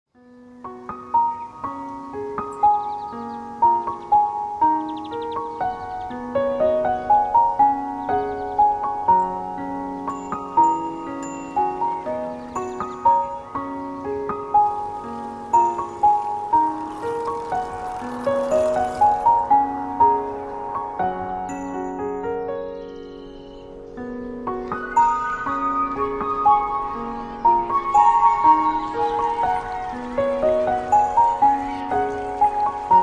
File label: background music